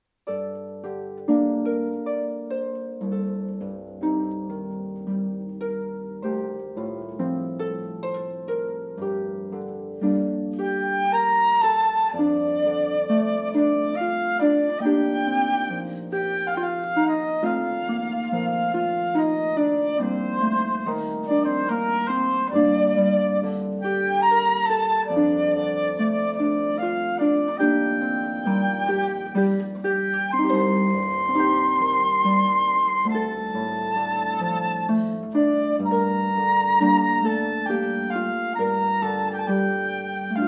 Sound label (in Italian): Arpa & Flauto